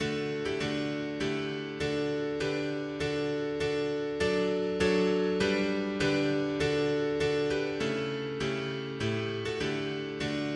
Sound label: mono piano; hymns; Himnario grande